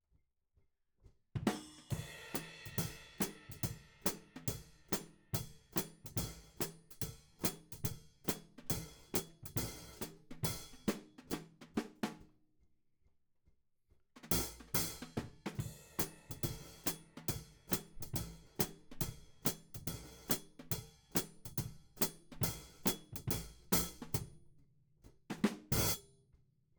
Hat

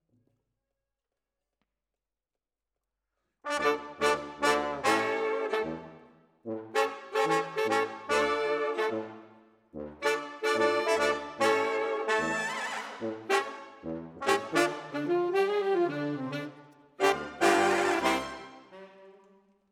Sax

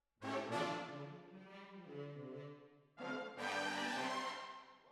Hall

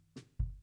BD

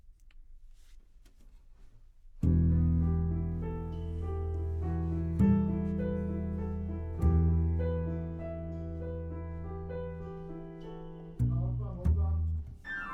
C Bass

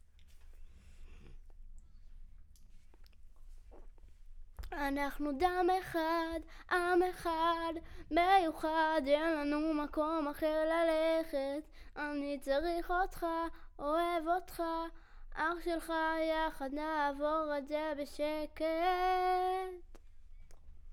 Children Choir; Studio Bekol Children Choir

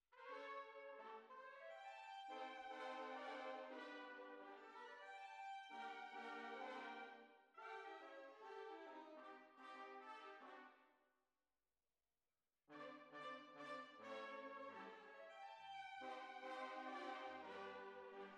Tuba, jazz for piano

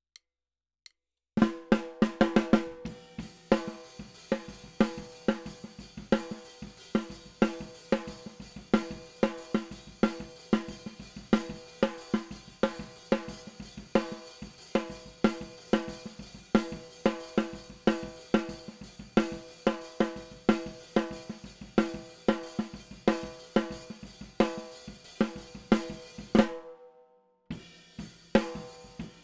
SNR

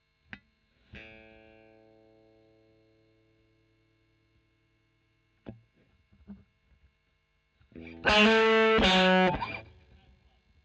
E. GTR Royer OD

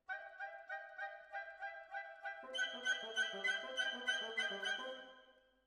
Violins, jazz for piano

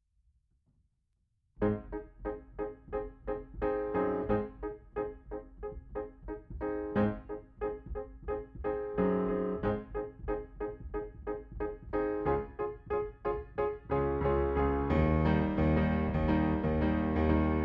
Piano Mono

